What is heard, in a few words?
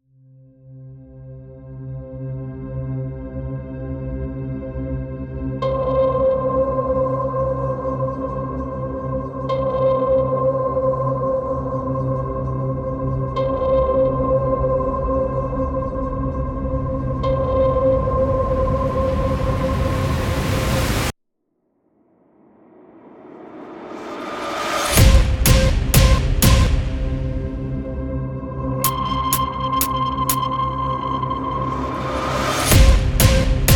Torpedo